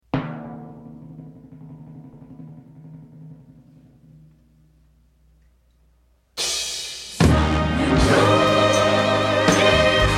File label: Stereo Band&Vocal Mix